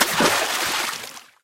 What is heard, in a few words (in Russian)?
malenkij negromkij vsplesk vodi